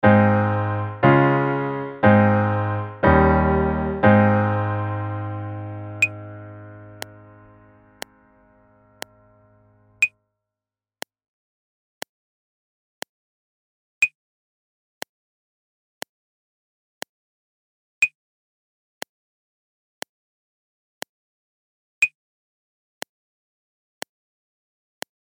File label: Ab Major 44 4 Measures wo Piano